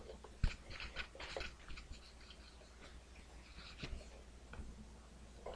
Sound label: bowl